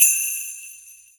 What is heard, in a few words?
SL Bells; EMU Orbit-3